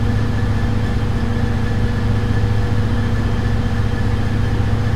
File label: idle loop, combine train; mechanical units